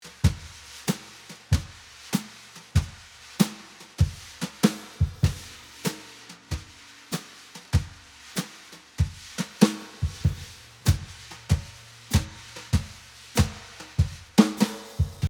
Xmas Drums With Spring

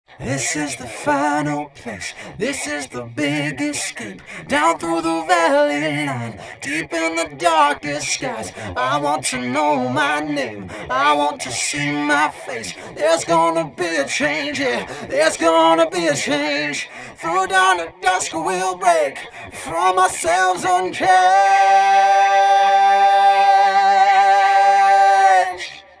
vocal synth

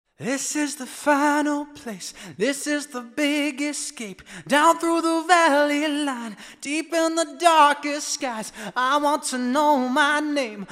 Voxengo Old Skool Verb

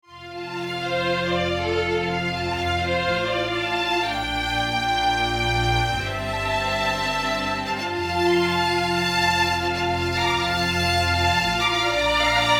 Studio Strings Example

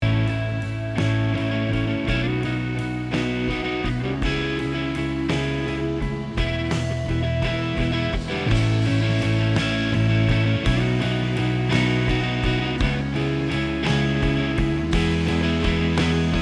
EQd Stereo Drums Guitar Bass

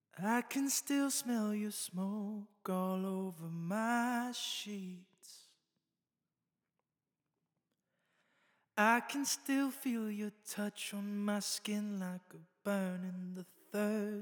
Little Plate Vocal Solo

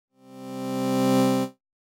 Synth With Slow Attack

